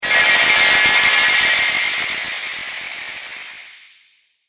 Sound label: Laser Gritty Teleport, sci-fi laser sound effect; Laser Future - Gritty Trek Teleport